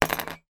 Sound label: foley metal clip small down on metal surface; zapsplat